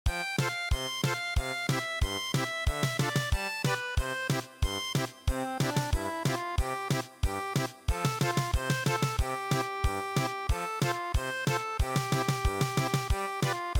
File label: little fox German Polka